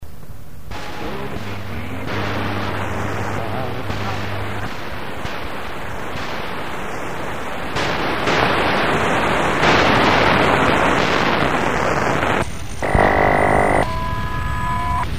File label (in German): Rauschen Studie